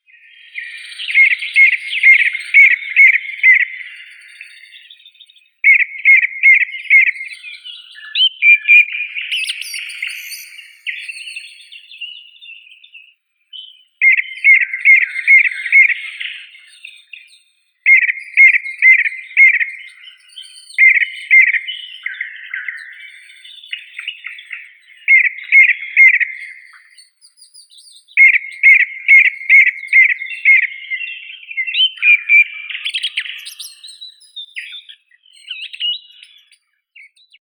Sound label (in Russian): drozd krasnozobii turdus ruficollis